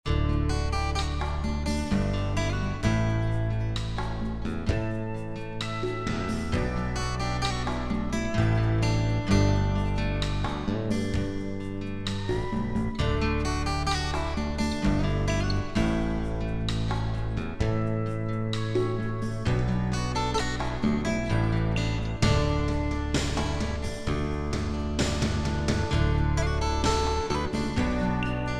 country

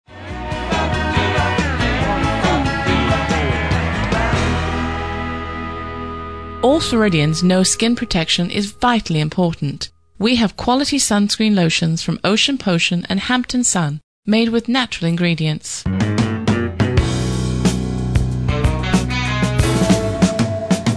overhead music

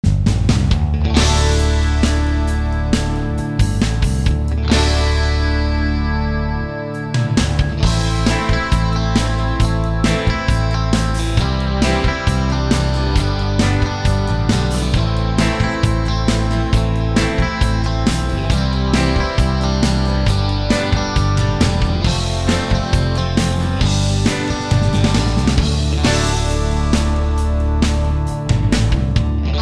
hard rock

